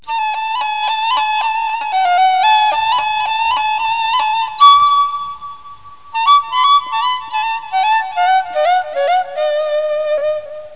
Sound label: flute